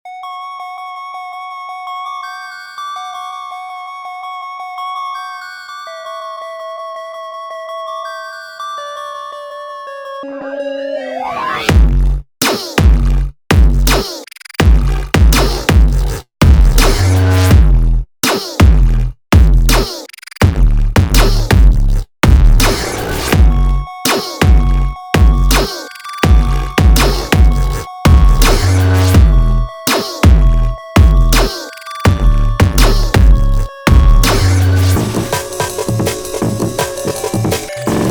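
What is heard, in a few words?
instrumental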